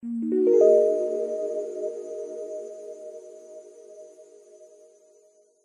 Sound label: multimedia game sound zen game soft warm relaxing lightly negative tone